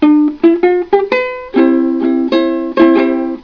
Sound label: vamp, ukulele